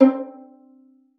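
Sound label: violin pizzicato C5; Xsample VOL-5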